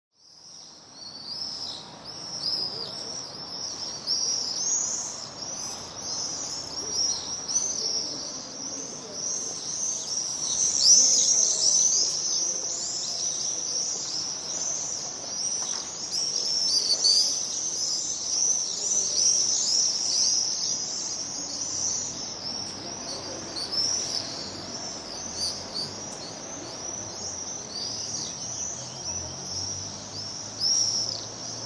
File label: swift apus apus